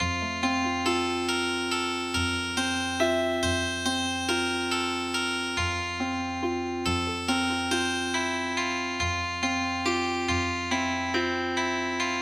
Healin Harp

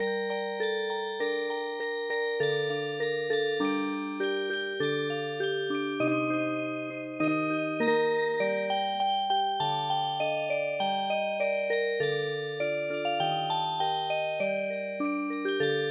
yakko san Music Box

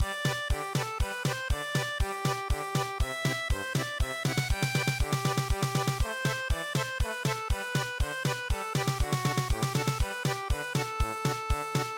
minuet German Polka

